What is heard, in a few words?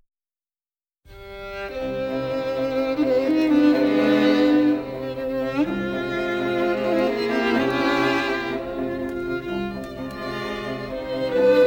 beethoven strings; loudness normalized; 30sec; mid loudness; landr